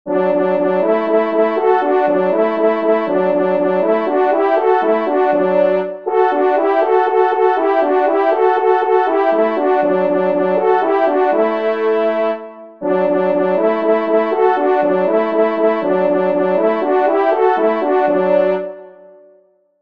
Biche Duo

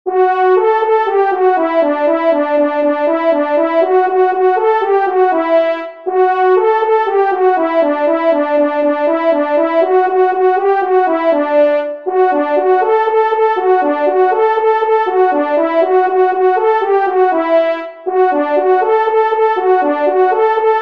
Honneurs Solo, trompes; Fanfares imposables en Concours

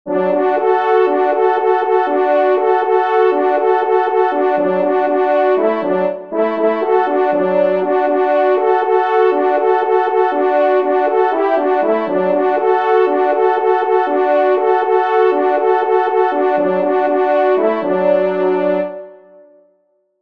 Change Duo, trompes

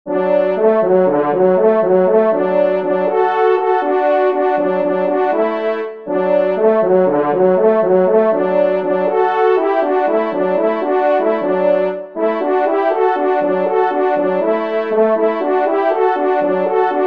Grand Sanglier Duo, trompes

